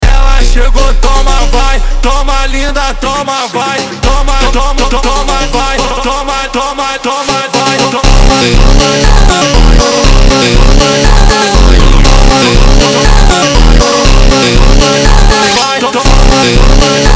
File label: Phonk MONTAGEM Slowed